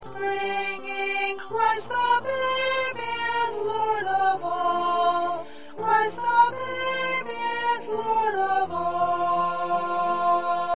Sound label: Soprano, choir